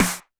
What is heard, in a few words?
TD-20 Tom A, Roland TD-20 Toms